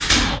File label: metallic engage; SciFiShipController